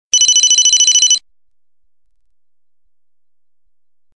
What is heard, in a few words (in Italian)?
alto, suonerie